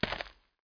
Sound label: walk dirt; MOTS